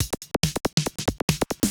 TECHNO BEATS 140

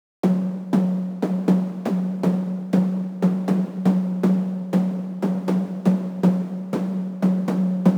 Synth D#m tuned toms 120bpm; Touch Loops

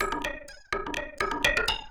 PERCLOOP INDST 125, Industrial Loop

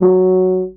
TUBA STRA F#2, Tuba Straight; Orchestral; DirectWave; Varazdin Orchestral

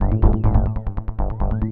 SNTH BASS ELCTR 140 A, Techno

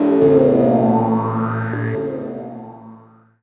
KEY SHOT TEKNO 140 A; Techno2; Singleshots

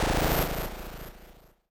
KEY SHOT TEKNO 140 A; Techno2; Singleshots

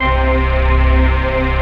dance chord

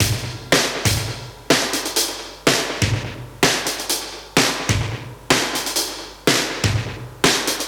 125 Classic Breaks, 125; Loops; Touch Loops; ClassicBreakSessions

